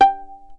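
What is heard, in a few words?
violin pizzicato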